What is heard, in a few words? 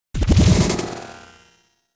Record Scratch, With Ascending Flutter Echoes.; Music Effect; record players